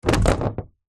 wood hardboard panel thin set down concrete ground; foley; zapsplat